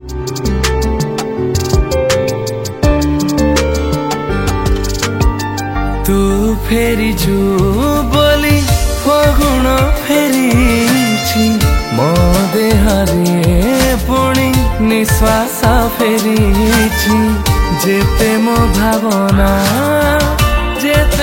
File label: Odia Romantic Song